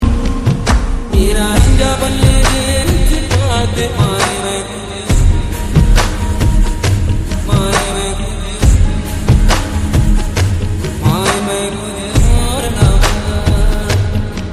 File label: BGM